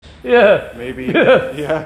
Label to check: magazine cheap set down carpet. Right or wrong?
Wrong